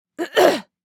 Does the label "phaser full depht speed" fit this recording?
No